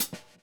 GRV, Groove; AMG Gota Yashiki Groove Activator

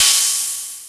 MS-20 Chin Cym up; Multisamples; Digital Sound Factory

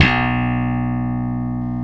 E Bass Slap

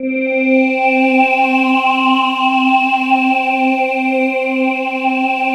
PAD, Drum & Bass, 160 BPM

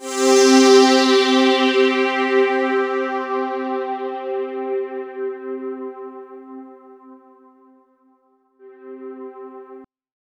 C3, Roland JV 2080, POLY SAW

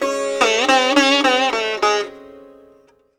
SITAR LINE; SITAR RIFFS2

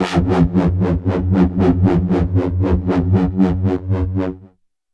Dark Step Bass, Drum n Bass; KJ Sawka; Drum n Bass & Beyond

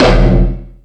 Multi FX

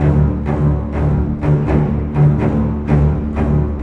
Cinematic Strings, Orchestral

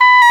WND OBOE Dry; Roland LCDP04 Orchestral Winds; Oboe 4-8; Oboe Dry 6

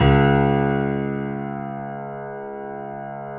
JAZZ C#1, Keyboards Acoustic; USB Soundscan vol.17

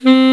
Alto Sax C3; Proteus 2000; Digital Sound Factory Proteus 2000

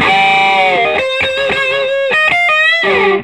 SOLO, Vintage Blues Guitar

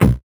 VocoBit Hit, 8 bit; musicradar; 8-bit bonanza

